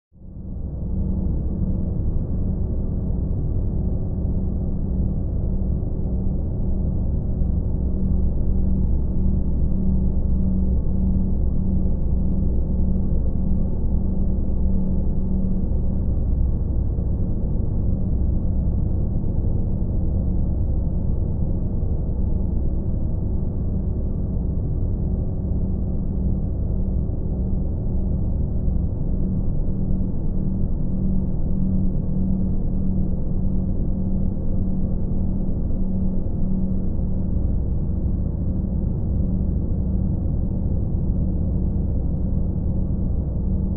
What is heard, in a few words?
Space Ship Eng Rm Amb; spaceship fx